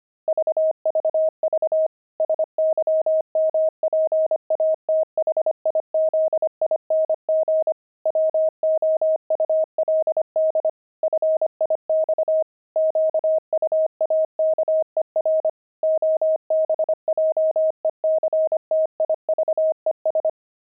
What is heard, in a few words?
pangram quaker